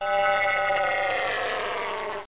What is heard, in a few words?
PAD